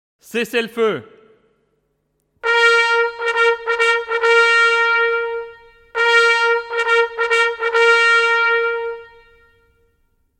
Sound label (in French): trompette; cessez le feu